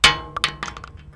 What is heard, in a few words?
metal; Mafie 1